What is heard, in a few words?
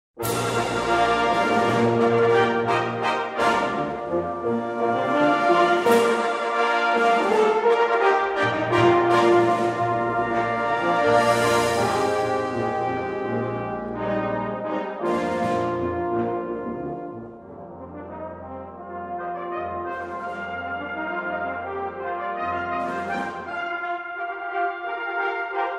Fanfare and Flourishes, band